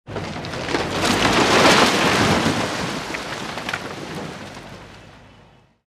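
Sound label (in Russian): разрушение кирпичной стены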